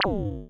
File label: Laser